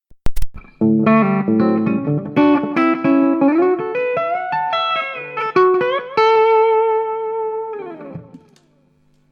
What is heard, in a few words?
country tapping